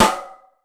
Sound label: TEK.S, TECHNO; 90 sSampleCDs; Crypton - DrumBase5000